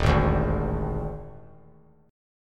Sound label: Eb Major 7th Suspended 4th Eb; strummed; 3,3,5,6; EbM7sus4